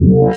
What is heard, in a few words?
rev back, bass